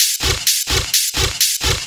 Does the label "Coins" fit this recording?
No